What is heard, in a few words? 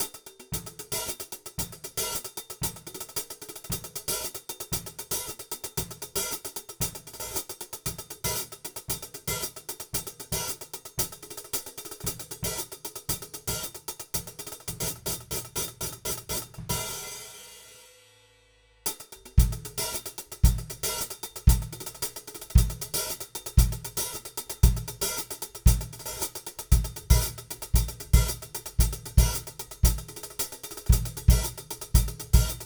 hihat, KM184 modded